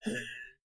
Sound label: Monster Strain